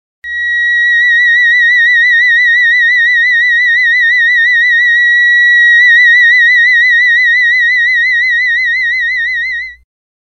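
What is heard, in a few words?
theremin note A sharp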